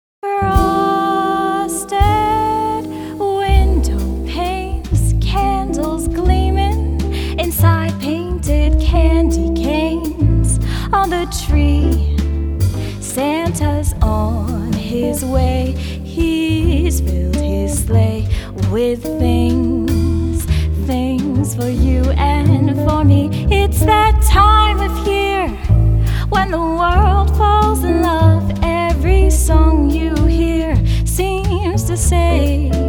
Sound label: Waltz; Christmas